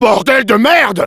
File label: demoman jeers, fr; "Bordel de merde !"